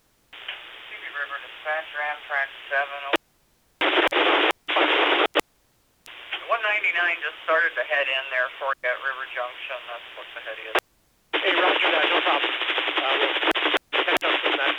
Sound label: Amtrak